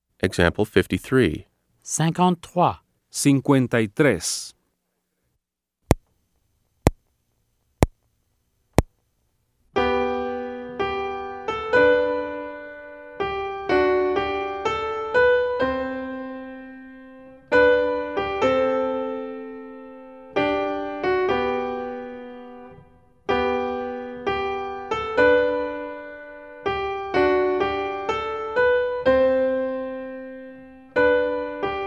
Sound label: Keyboard